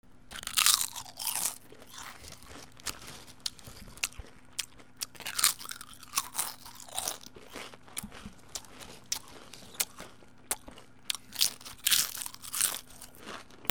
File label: PEOPLE